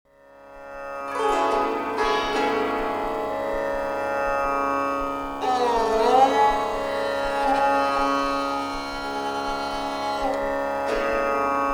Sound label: saranghi